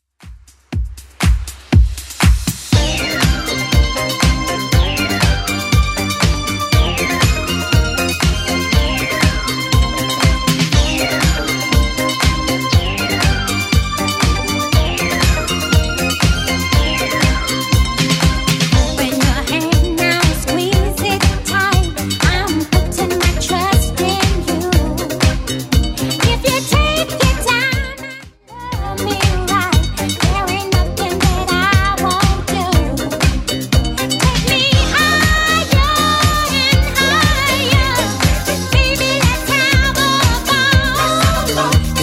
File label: 80s Club Edit 120bpm